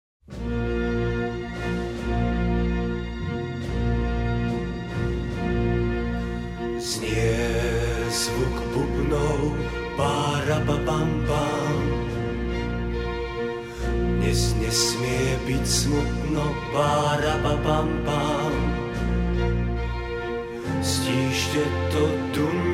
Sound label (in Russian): Zvuk bubnov